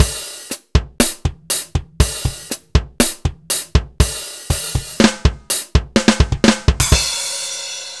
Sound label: Slow Groove